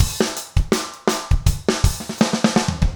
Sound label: trip hop 80